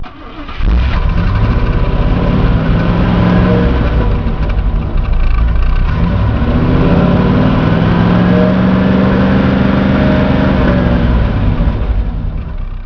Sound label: p5 motor